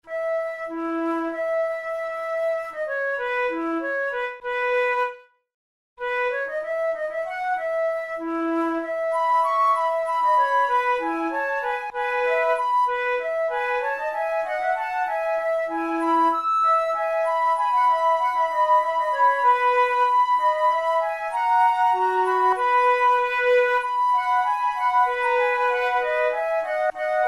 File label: sonata for two flutes in e major adagio; schultze